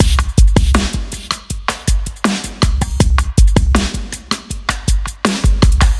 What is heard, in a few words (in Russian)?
ударные и барабаны эмбиент; Filigree